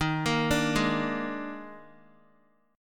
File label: Eb Major 7th Suspended 4th, Guitar; arpeggiated; x,6,8,7,8,x; EbM7sus4